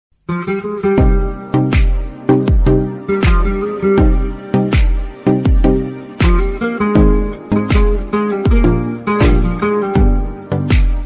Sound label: instrument ringtone